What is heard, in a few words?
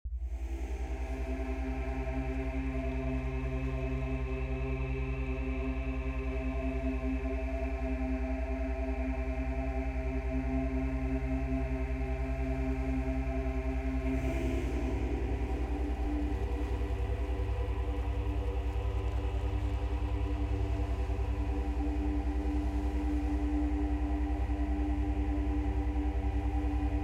Binaural Alpha Beats Meditation and Lucid Dreams; Ocean